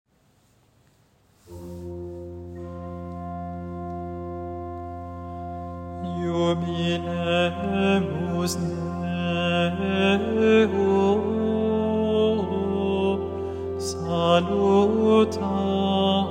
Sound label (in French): antienne invitatoire latin; lundi ordinaire